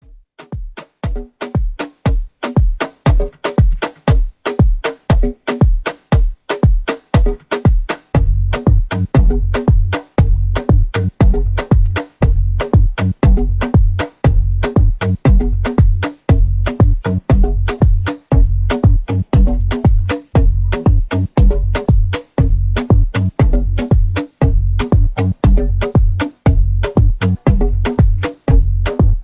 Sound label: Instrumental